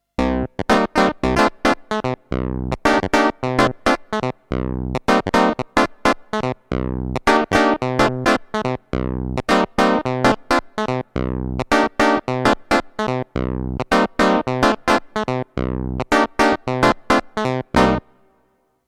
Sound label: Clavi